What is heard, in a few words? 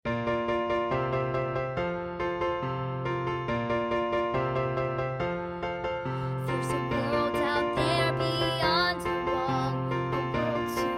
Wall Vocal